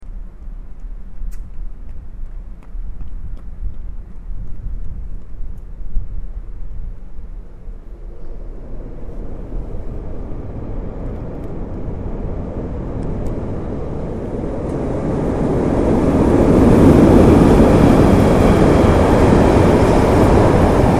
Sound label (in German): sudbrucke vorbeifahrender guterzug und kahn; koeln